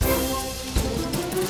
Music Mess Theme Bpm160 Start; asterix obelix slap them all 2

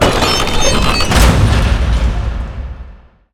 door; Shin Megami Tensei IV Apocalypse; 3DS

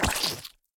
Wall stick Impacto

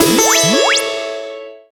Boss Hit

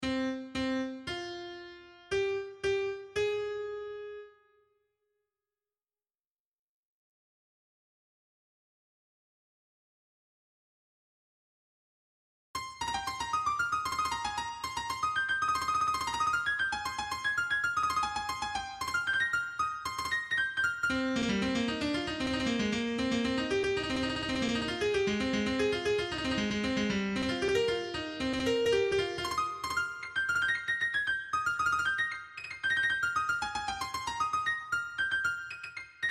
MIDI; friday night funkin vs. umbal family computer

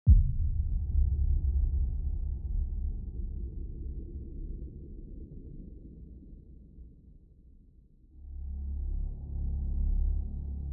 Ambient

